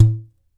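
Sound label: KAE KEEKE, HAWAII PERC; AKAI S6000 CD-ROM; WorldSounds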